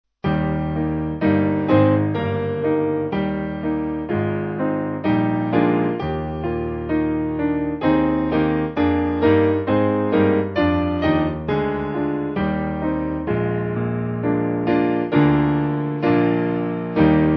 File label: Piano, backing; 4/Eb; Ill Praise My Maker; Monmouth